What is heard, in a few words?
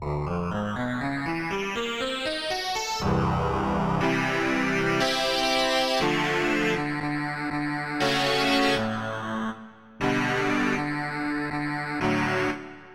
Lead Stick